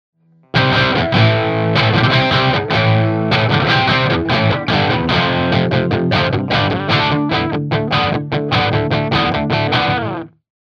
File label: rock; ampvergleich